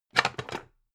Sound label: pan take